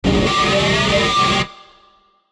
Ascend Swell Feedback Screech; Blastwave

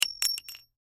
Bullet Shell; Bullet Shell 44 Drop Cement; Drops (Sound Effects:Objects); Blastwave; Warfare

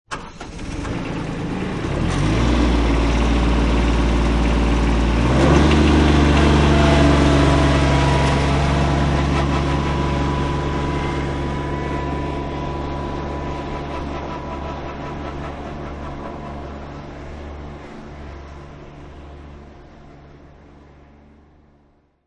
Tractor Deisel Driving Away; Tractor: Diesel tractor drive by, right to left; Tractors; Vehicles

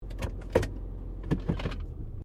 CAR SUBARU LIBERTY INTERIOR HANDBREAK OFF

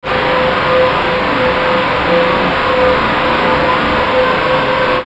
Static Digital Metallic, Static Smooth Hiss; Production Element Imaging Element Accent Transition; Blastwave; Static Sound Effects

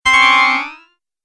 Tap Up Delay, Button Sound Effects; multimedia sound effects

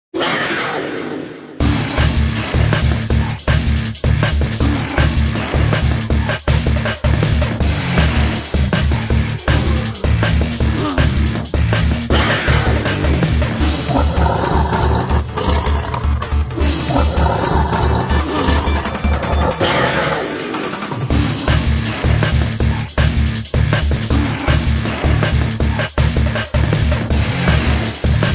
Tiger Metal; Music Ringtone; Musical

